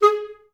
STAC G#3, Orchestra; Best Service; Peter Siedlaczek's Advanced Orchestra

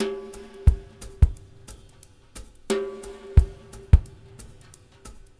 SWT DRUMS, SWINGTOMS; Spectrasonics; Liquid Grooves 1 & 2

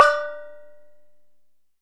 D3 HARD, Kye Waing

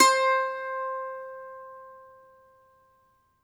MANDOLIN, Plucked Strings

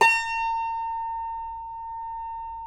CUMBUS A#5 C, guitars; Spectrasonics; Hans Zimmer Guitars VOL-2

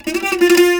JAKAY; Spectrasonics; Heart of Asia

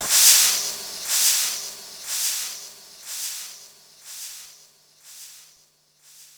Brush Swirl, Korg 05R-W Korg Snares; 300 Drum Machines

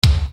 Bass Dr, EuroDance Bdrums

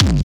O D KICK, PHUZZ DRUMS; Spectrasonic Distorted Reality 1

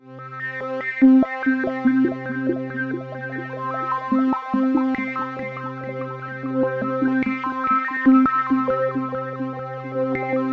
Roland JV 2080, C3; MISSION LFO

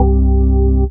DM ORG1, Dance Mega Synth

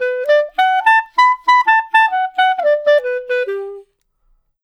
Clarinet Solo

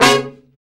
BRA SECT; BRA SECTIONS

